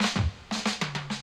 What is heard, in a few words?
Classic DrumLoops